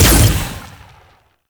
Fire Shot; Warframe; Weapons; Duviri; Incarnon Adapters; Incarnon Dex Sybaris

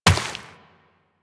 Step Rock, Footsteps, Walk; Warframe; Tenno

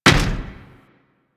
Step Default, Footsteps; Warframe

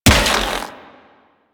Rifle Charged Shot; Warframe; Infested; Quanta

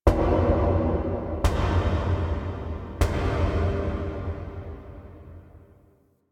Misc Knocks Large; Ambience

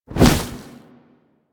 Bird Of Prey Wing Flap Down; Characters; Warframe

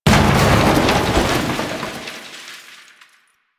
Desk Destroy; ambience; Warframe; Entrati

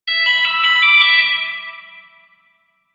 Pager Ringtone; Entrati Labs

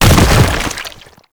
Proto Infested Bodyfall Default; Enemies; Warframe

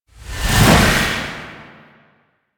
Slide Swing, Melee; Warframe; Weapons; Duviri; Incarnon Adapters

